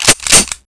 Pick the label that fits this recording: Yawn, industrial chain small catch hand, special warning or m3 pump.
m3 pump